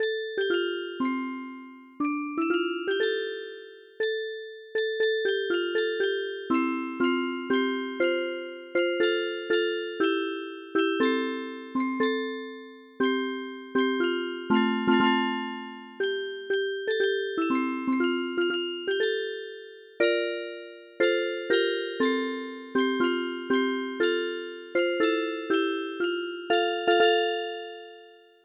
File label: MELODY